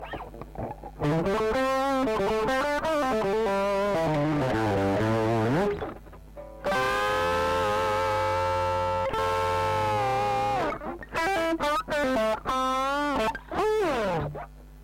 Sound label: guitar